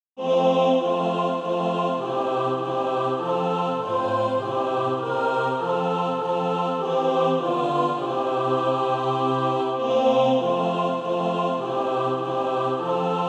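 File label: mixed, SATB Practise Music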